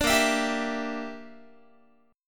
A sharp 6th Flat 5th, Guitar; A#6b5; strummed

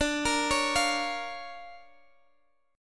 D Minor Major 7th Sharp 5th D Guitar Standard; x,x,12,15,14,13; DmM7#5 arpeggiated

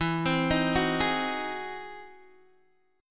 E Augmented Major 9th E down Guitar DADGAD

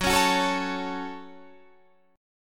Gb Minor Gb Guitar Half Step Down

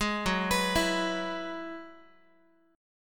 Gb 7th Suspended 2nd Suspended 4th, Guitar; arpeggiated; JGuitar

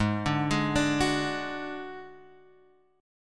D Major Flat 5th, G sharp

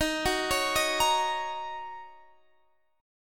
Gb 6th Eb

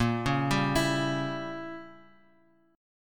A sharp Diminished 7th, Bass Standard; arpeggiated; JGuitar

